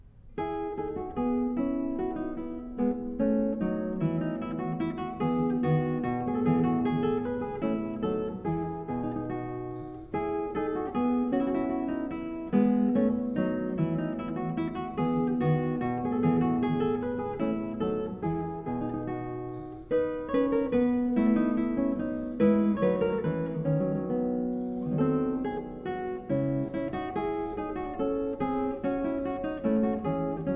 Partita for solo violin No. 3 in E major, Menuet I; BWV 1006; Bach; The Six Sonatas and Partitas